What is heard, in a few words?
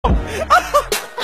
Laugh; Wonho